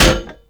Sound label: Cast Iron Hit; Gameplay; Awakening Remaster